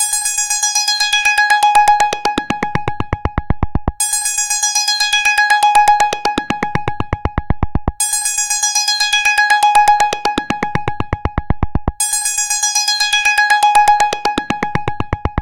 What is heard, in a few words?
Mildly Alarming, ringtones; LG GT540 Optimus